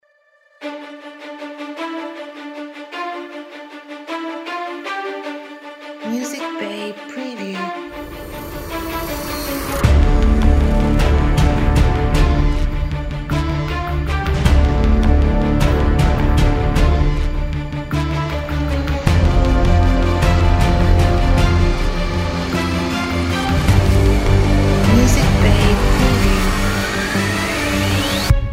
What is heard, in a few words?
Hybrid Action Cinematic Trailer